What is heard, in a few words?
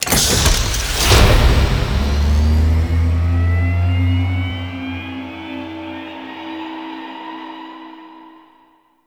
Coolant Machine Activate; Venus Open World; Objective Rooms; Deck Twelve